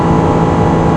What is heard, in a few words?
bike; CASTROL